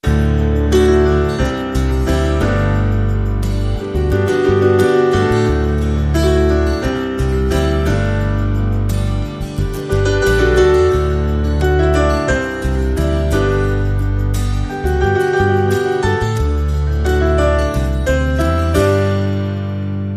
Easy, No Drums

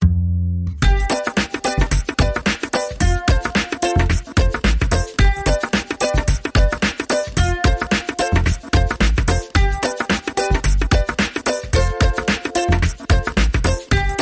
Mariachi Funk

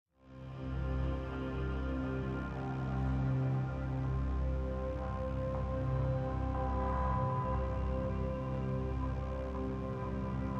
Ticking, Drones Only

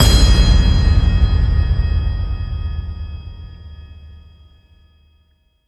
Chime Hit; Santa Spirit

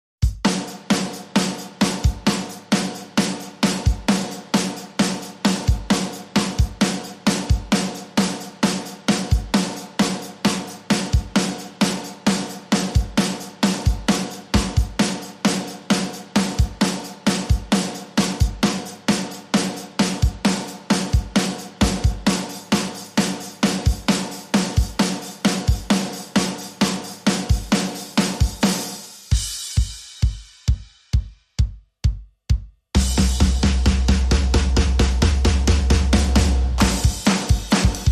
Drums and Organ

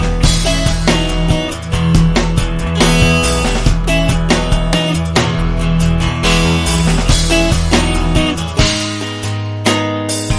Chorus

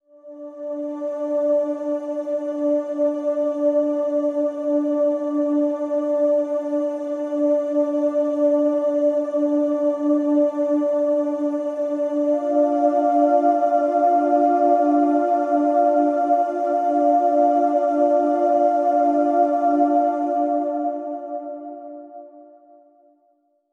Sad Layer

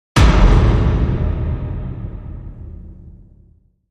Hit

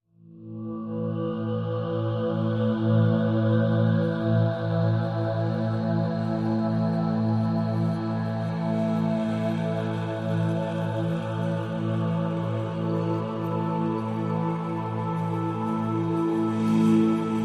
Natural Element A, No Flute

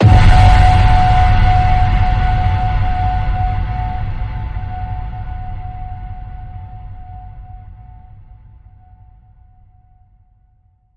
Ping Pong, F sharp

